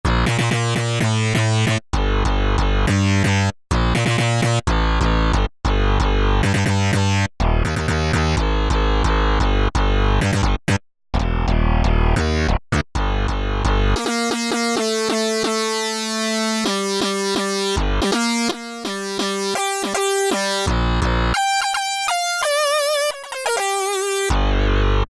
Killer Synth, Factory ROM kits

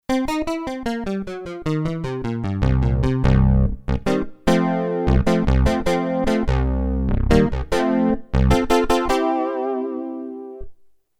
Prophet Pulse, Kurzweil K2500X; Factory Programs

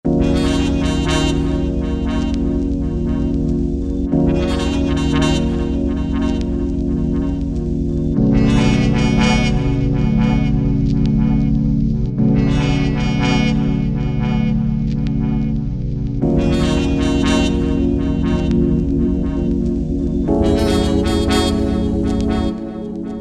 Jazz, synth; Parrotz

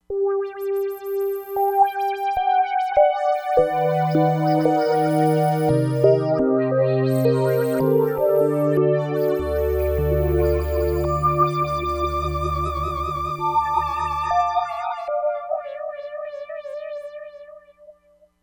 MODULATED GHOST, Crumar Bit 99; Factory Programs

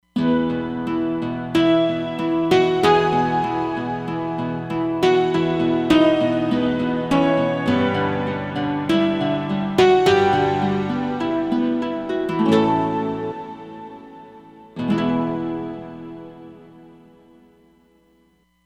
Nylon Dreams

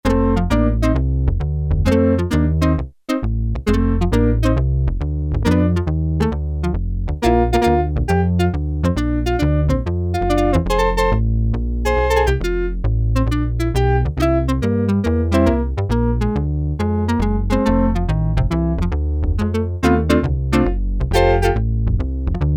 Organ; Sequential Circuits Prophet-5 (Ver.3); Factory Patch demos; Triffid Organ

